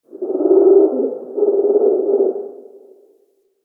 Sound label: Forest Creature Night Monkey Low Distant; Ambience; Warframe; Grineer Forest